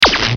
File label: zap; Oddworld